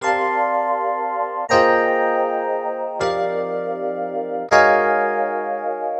Piano Jazz like, Drum & Bass Keys; Sonic Foundry (Sony Creative Software); Knutrix Drum & Bass

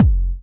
BD, Dance Mega Drums, HIP

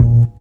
Jaz Bass; Equipped Music

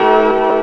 Organ; Zero-G Jungle Warfare 1, 2 & 3; 90 s Sample CDs

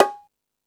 Latin Drums; E-MU Pro-Cussion

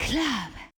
Club; Yamaha DJX-2B

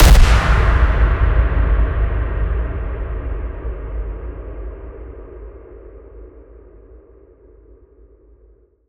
impact sudden; Virtual Riot

